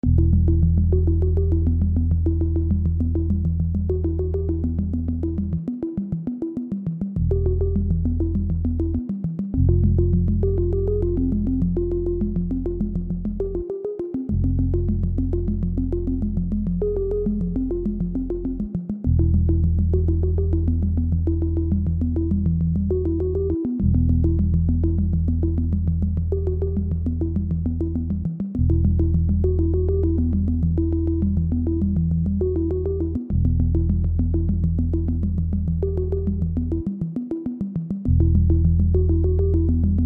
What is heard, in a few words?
Speaking Numbers